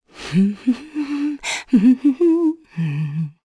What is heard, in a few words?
Vox Hum; Shamilla; kingsraid